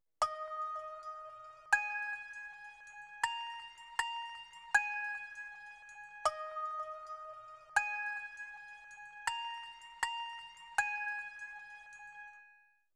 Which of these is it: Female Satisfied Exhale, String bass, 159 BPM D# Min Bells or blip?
159 BPM D# Min Bells